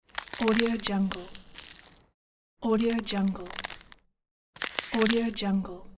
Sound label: cracking ice; greenwaysound